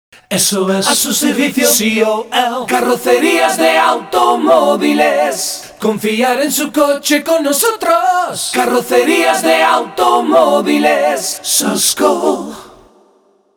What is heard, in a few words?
short acapella mix; Soscol